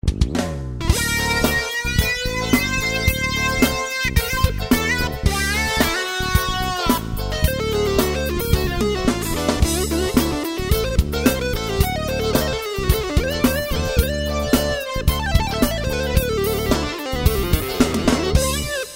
teclas solo de sintetizador

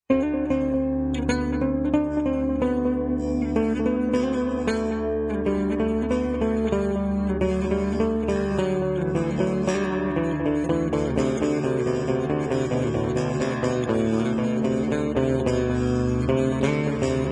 solo inicial 808

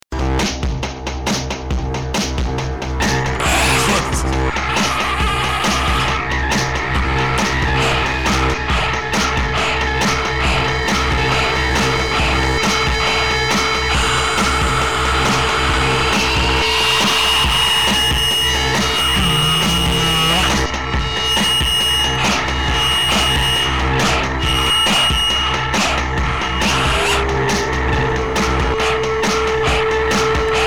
instrumental sincopada

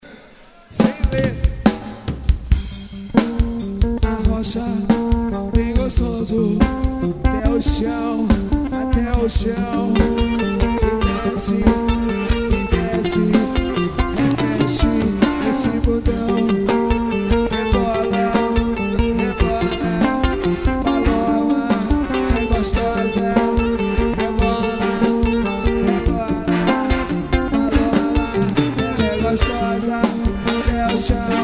samba ao vivo

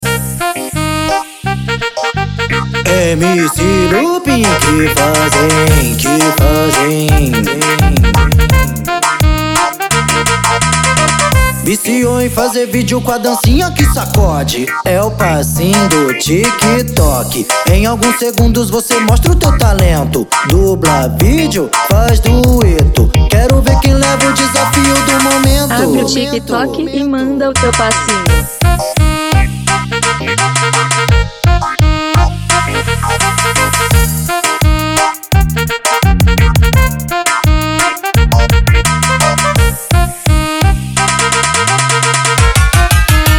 brega funk remix

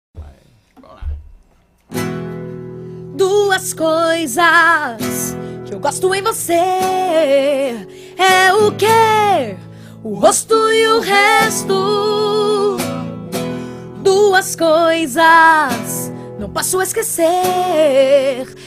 voz violao

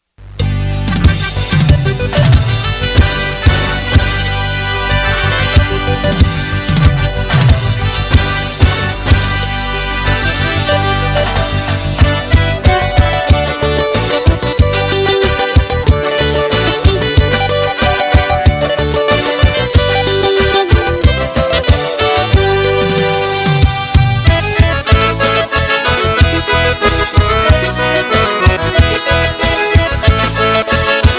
abertura forro

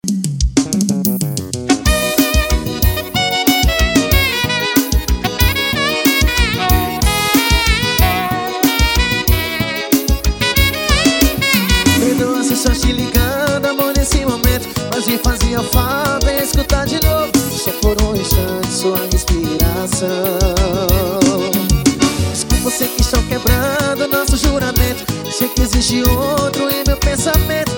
medley xote retrô

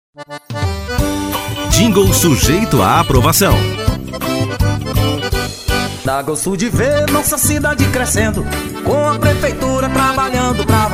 jingle politico; cidade crecer

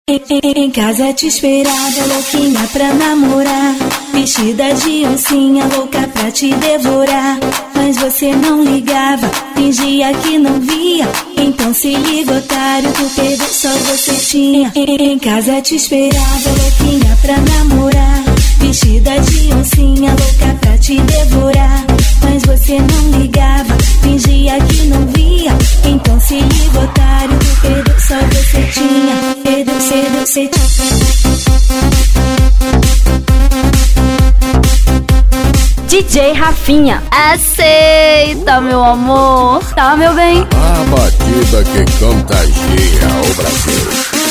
eletrofunk

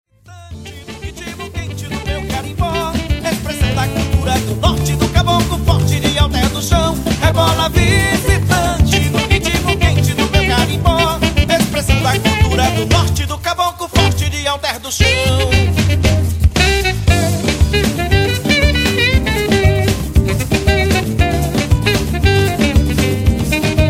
carimbo sax alto solo; carimbo de alter do chao

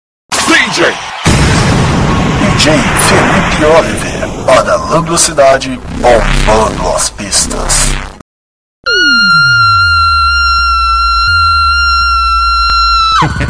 funk automotivo

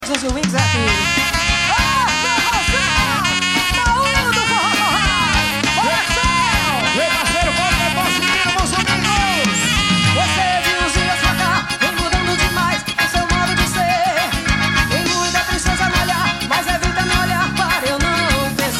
forro ao vivo

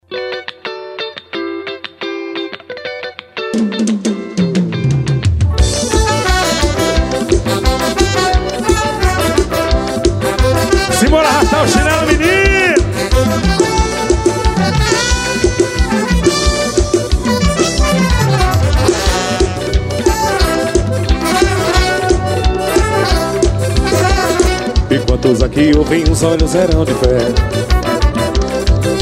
frevo

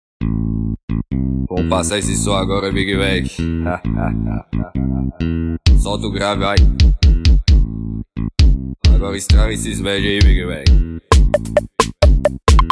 passagem de som

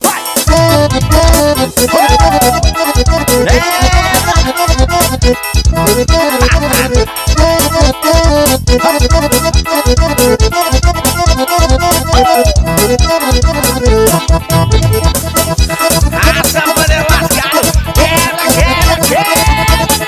ao vivo solo da sanfona

